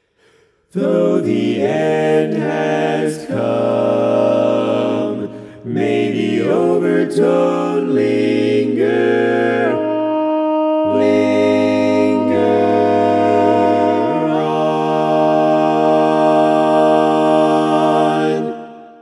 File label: Overtone TAG TTBB